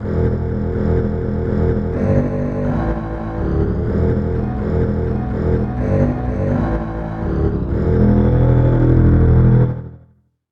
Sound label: tremolo bass